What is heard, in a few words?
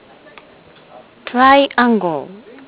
Triangle; Aibo; CMPack04